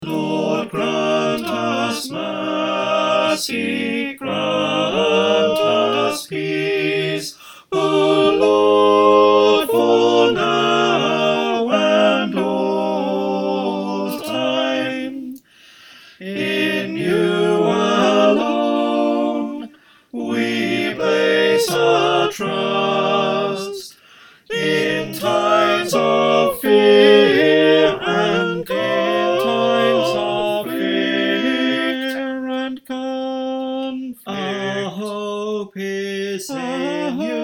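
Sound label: soprano alto tenor bass, choir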